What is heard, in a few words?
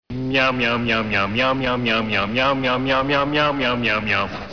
meow meow